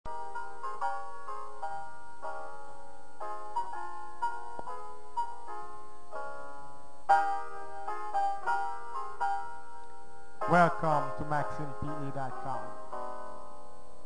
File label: music